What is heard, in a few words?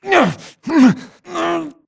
grunt Struggle; Titanfall 2